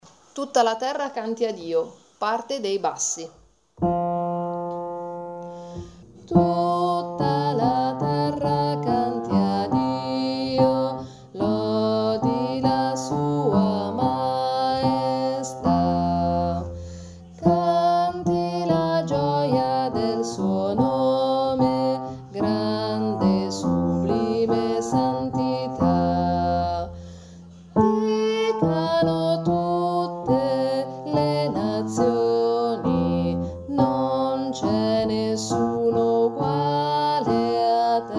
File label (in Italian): Tutta la terra canti a Dio bassi